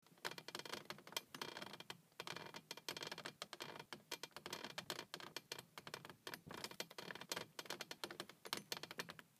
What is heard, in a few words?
Crank